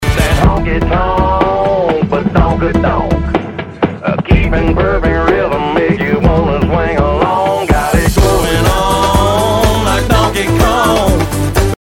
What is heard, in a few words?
honky tonk